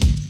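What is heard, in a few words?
kick, 60s kits; 90 sSampleCDs; Crypton - DrumBase5000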